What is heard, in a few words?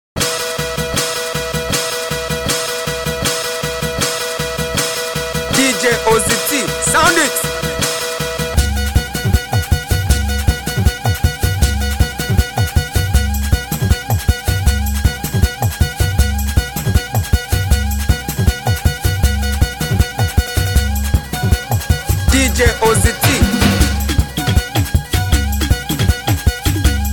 Beat